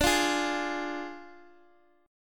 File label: D Diminished Guitar Drop D, chord